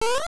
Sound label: boing; GBA; MLSS